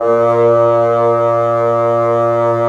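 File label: WND BN, wind bassoon; LA Composer vol. 3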